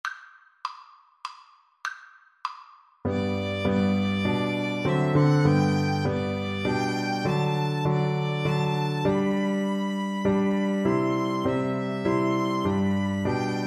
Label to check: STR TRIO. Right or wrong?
Right